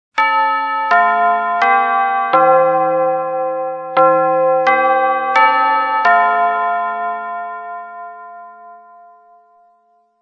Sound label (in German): gong; elektronik; bbgong